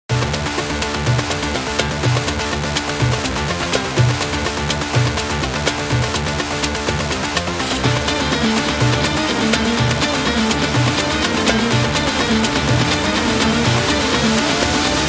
Arabic; gym rock

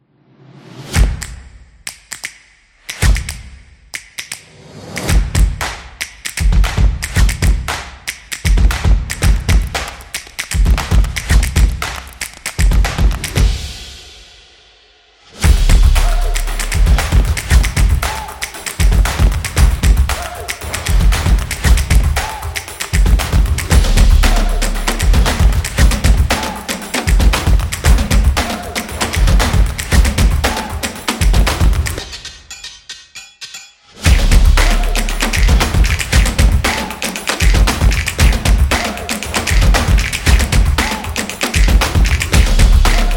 Percussion Energetic

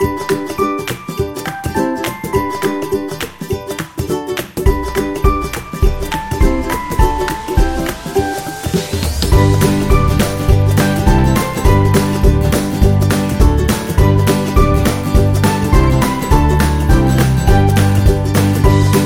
Happy Ukulele Fun, happy music

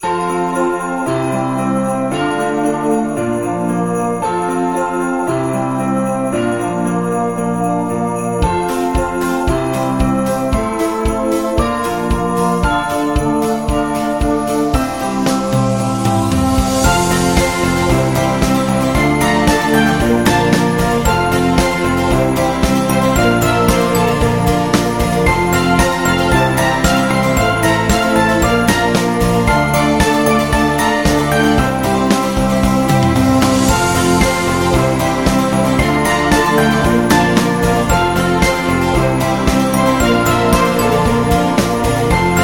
The Christmas Music

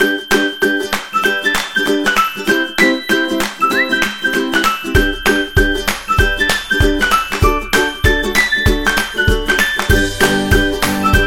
Simple Folk, happy music

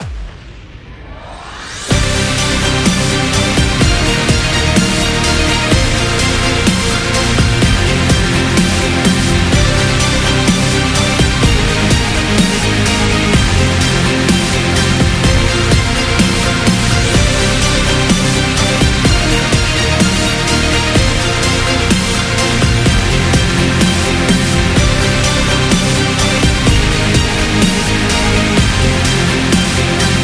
Epic Ending

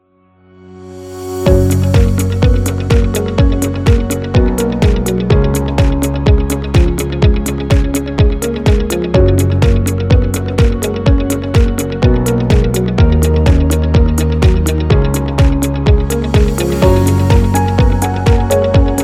Office Background

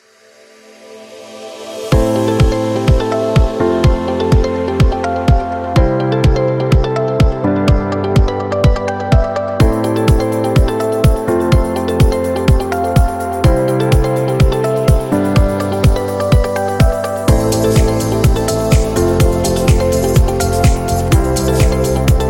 Fashion Beat

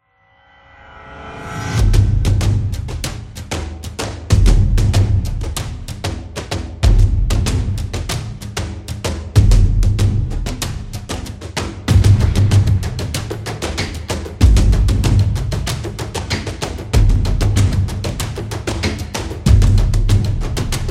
War Epic, epic drum

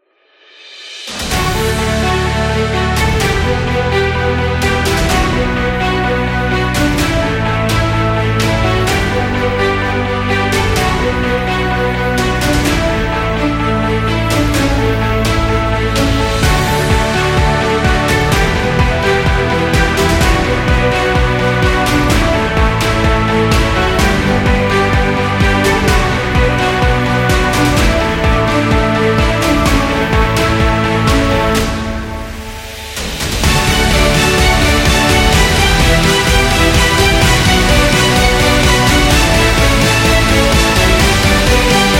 Corporate Epic

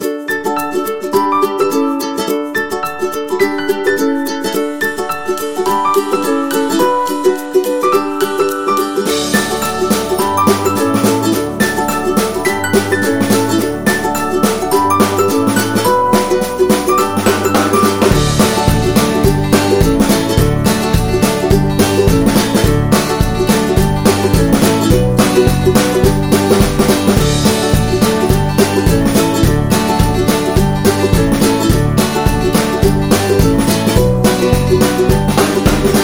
Kid Game; birthday music

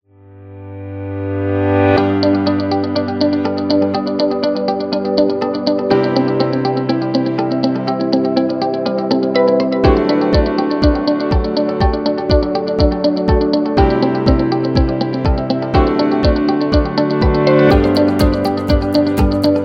Light Background, corporate music; corporate music vol 15

